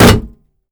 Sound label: Bullet hit metal; Doom